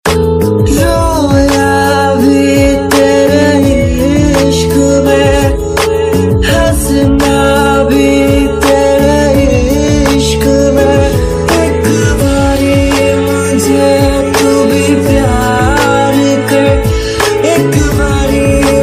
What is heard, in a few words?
Love Sad Tone; Indian Pop